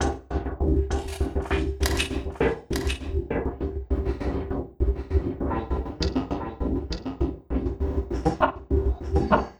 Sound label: Envelope Beat